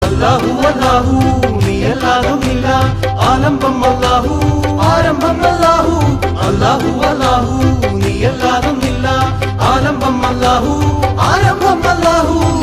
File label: Love Ringtone